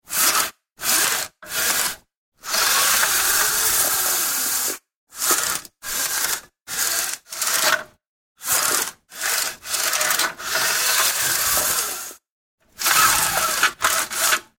Venetian Blind, Sound of a wooden Venetian blind being opened/Closed.; Windows (Sound Effects:Household)